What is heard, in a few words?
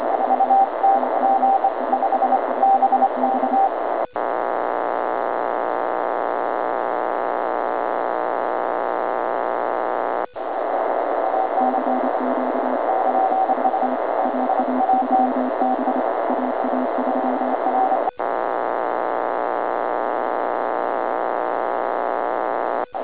80m CW, Noise; HAM; Noise Example